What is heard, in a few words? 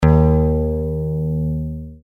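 E flat, guitar, E flat tuning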